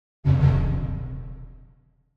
Drum Deep Symphonic - Orchestra Drum Double Hit - Higher; Hollywood Edge; sound designer's toolkit v2